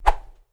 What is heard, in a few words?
Sword Swipe